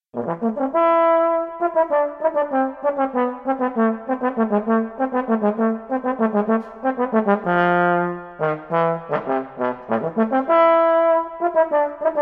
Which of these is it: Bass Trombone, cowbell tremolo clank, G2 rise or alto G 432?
Bass Trombone